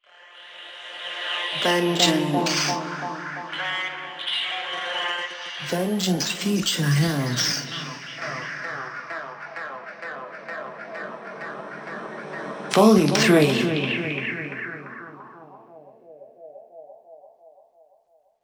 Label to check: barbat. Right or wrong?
Wrong